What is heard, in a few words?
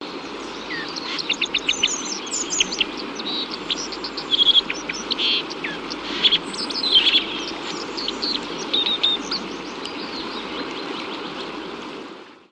European Dipper Cinclus cinclus